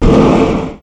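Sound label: Collision Exterior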